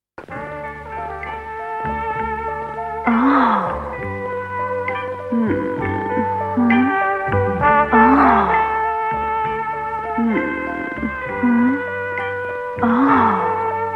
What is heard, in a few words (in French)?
Trompette